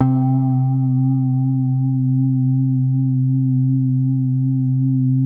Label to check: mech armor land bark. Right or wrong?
Wrong